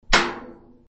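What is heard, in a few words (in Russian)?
удар по металлу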